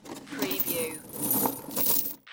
Chain Metal Rattling And Drop

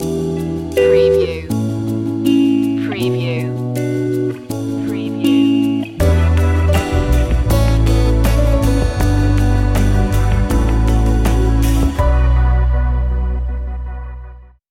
time to relax stinger

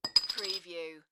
Beer Bottle Tops Drop; beanstalk audio

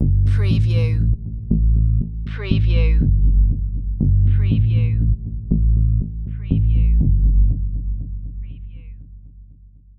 Deep n Creepy

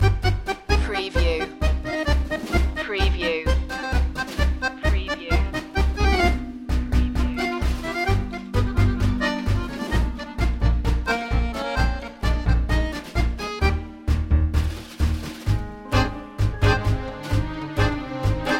tango loop

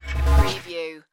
futuristic door slide

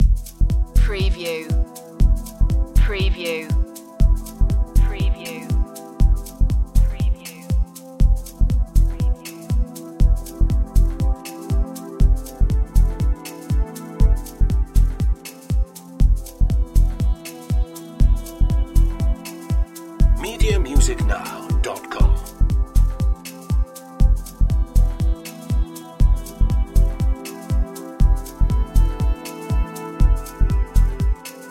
no waves fx; balearic isles

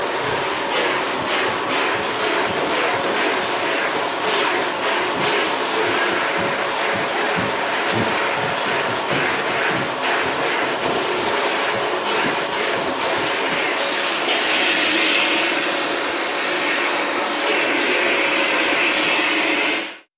Vacuum

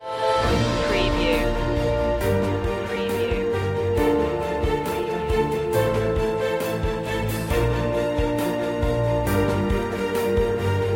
the fountain of life no choir

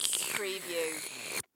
Slurp Drink Sip; sound effects bible

